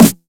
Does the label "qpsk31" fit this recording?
No